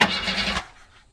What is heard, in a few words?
car fail